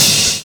CRASH CRO; Univers Sons - Kitch 70s

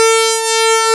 ELEK PAD; ELECTRC

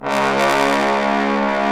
BRS BSTBN, Bs.Bone Sect

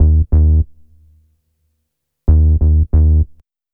BASS, Hip-Hop & R&B; USB Soundscan vol.47; AKAI

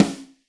Snare, Roland TD-20

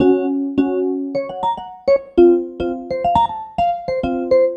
Keyboard

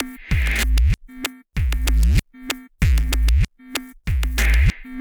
Structured Rhythm, IDM Rhythms

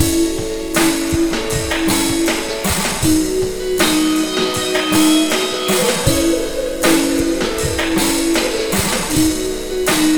Slo Mo Full-F#, Slo Mo Funk Mixes, Lounge; Zero-G Kocktail Kollection; Construction Kitz (Lounge)

